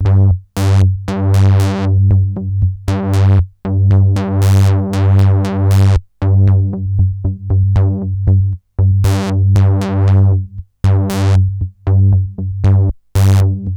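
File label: sample and hold G1; Best Service Dream Experience; R100M S+H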